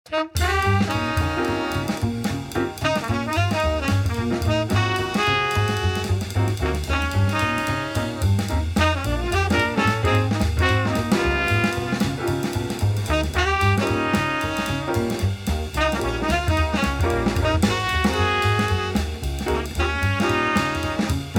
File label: Blues Etude bVII7